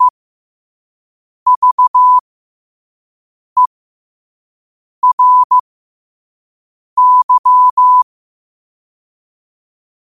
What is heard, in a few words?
5 wpm, morse